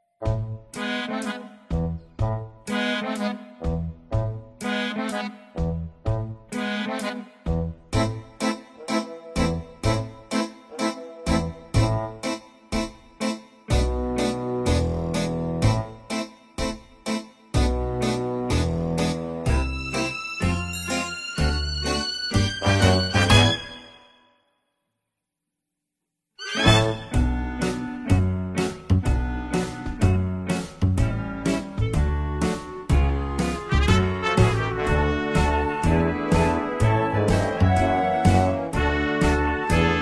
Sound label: Instrumental; Pinocchio; academy players audition materials